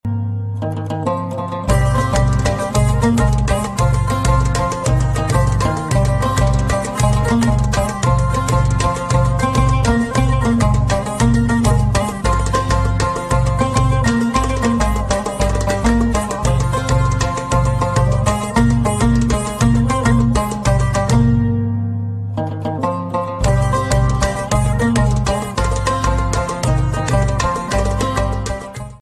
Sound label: orient sound ringtone